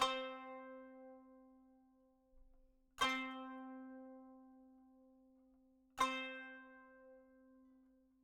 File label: kanun